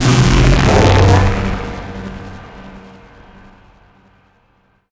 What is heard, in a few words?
damage; Barotrauma; Jove